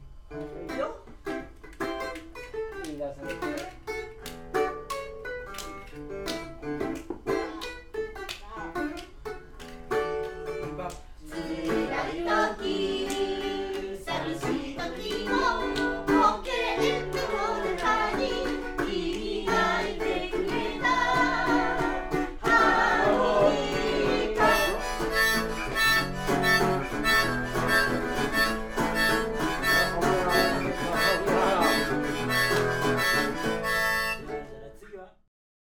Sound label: harmonica